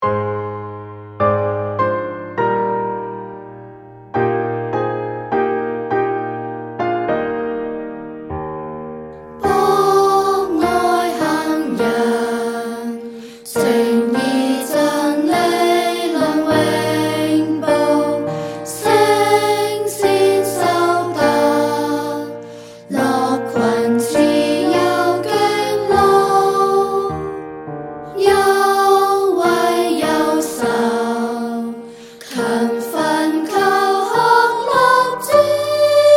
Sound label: school song